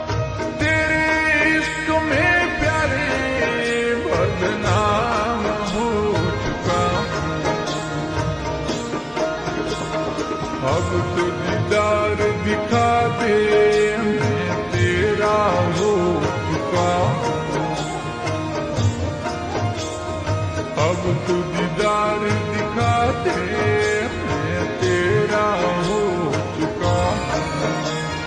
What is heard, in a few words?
Ringtone Slowed Reverb